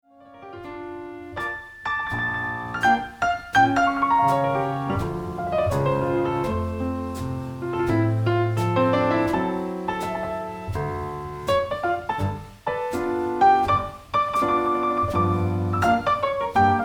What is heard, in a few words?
Blues In Thirds